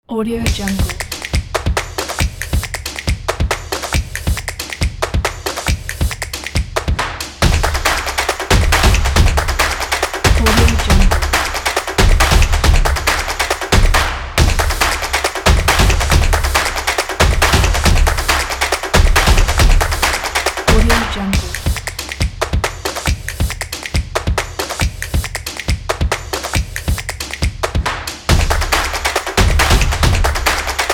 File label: drum